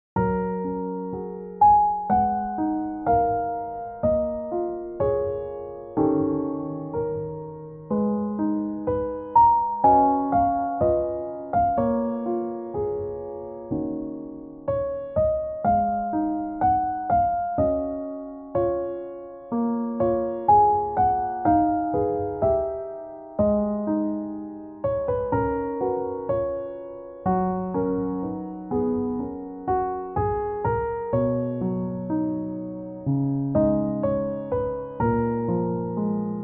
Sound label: Newage, 감성